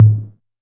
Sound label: TR808 Tom, Roland SH-32 Drumset